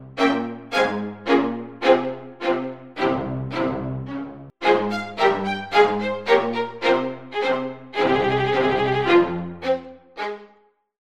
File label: Strings Violin Spiccato; Synthphonica; Syntheway